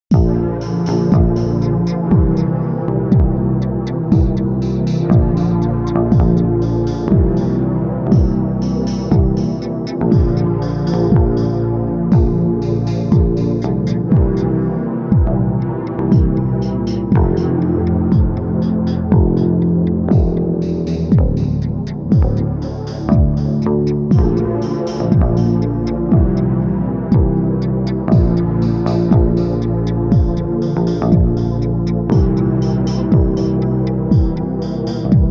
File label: pad